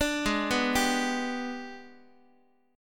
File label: G sharp Minor Major 7th Flat 5th G sharp, Guitar; arpeggiated; JGuitar